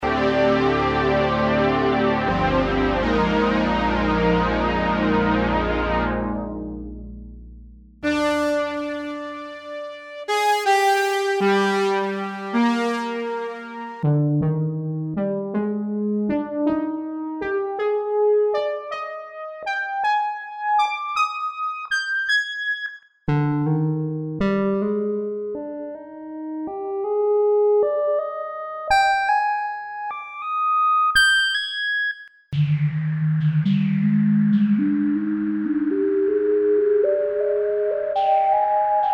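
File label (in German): HIP HOP Producer Bässe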